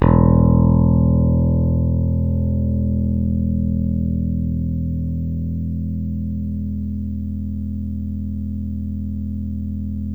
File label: PICK BASS, Electric Basses